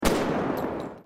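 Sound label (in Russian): simple shot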